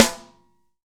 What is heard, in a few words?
SNARES DRY; Real Mega Drums